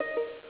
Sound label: notify